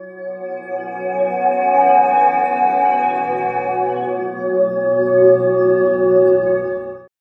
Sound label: morph dreams